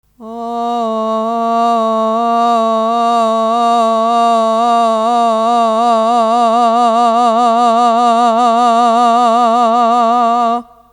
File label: vibrato